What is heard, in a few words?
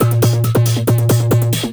percussion loops, 138, Techno